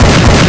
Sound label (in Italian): sparo, armi